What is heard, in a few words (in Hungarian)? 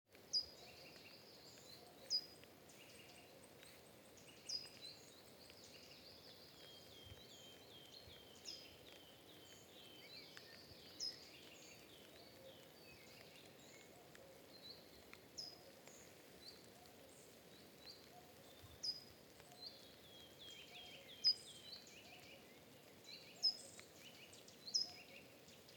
rovidkarmu fakusz hangja